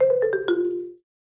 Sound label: Marimba Accent; mallets marimba